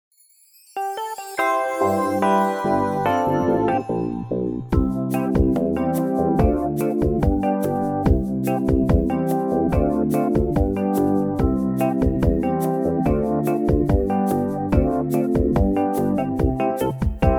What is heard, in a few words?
BACKING TRACK